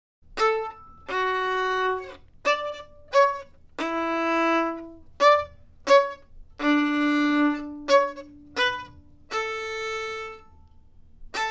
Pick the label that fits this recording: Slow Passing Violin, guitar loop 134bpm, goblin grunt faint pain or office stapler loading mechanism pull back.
Slow Passing Violin